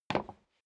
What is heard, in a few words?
step sneaker wood creak single stair down; schritte